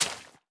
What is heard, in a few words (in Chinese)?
湿海绵 破坏声